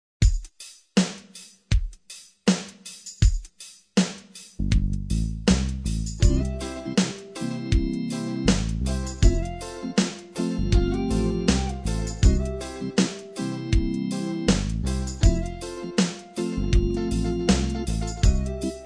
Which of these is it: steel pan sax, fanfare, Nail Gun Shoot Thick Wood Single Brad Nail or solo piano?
steel pan sax